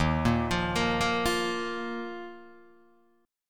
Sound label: Eb Suspended 2nd, Guitar, DADGAD